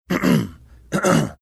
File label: clearing throat